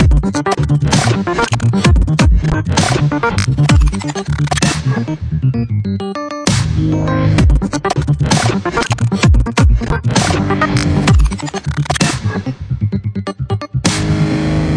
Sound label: 130 dubsteppy glitch n epiano